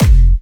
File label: Kick Bonk